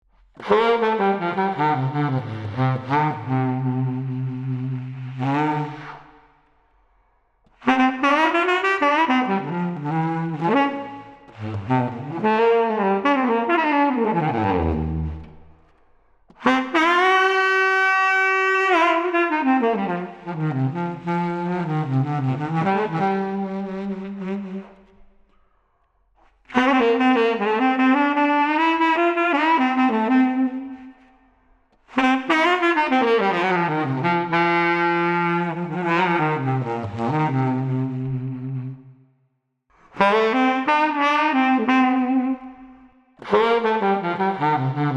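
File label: Street Tenor Sax Blues Lament, 62 bpm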